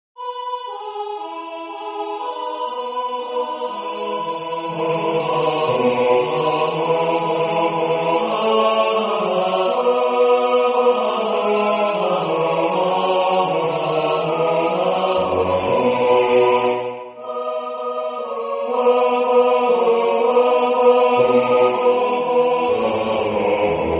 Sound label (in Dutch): Chorus Bas; H.Purcell